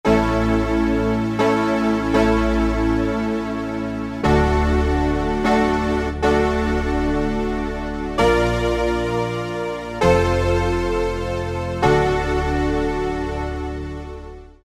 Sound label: Wood, Yamaha TG33; Factory Presets; Preset bank 1